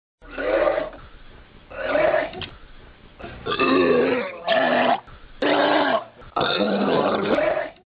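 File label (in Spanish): vomitando; bomitando; sonidos insoportables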